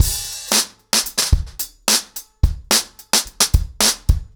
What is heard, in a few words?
110BPM, ACOUSTIC DRUMS; The Roots Drumloops